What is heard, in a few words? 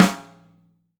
Snare Hard